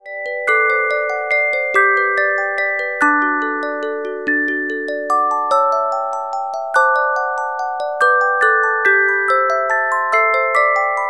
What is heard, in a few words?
Carillon Music Box Melodies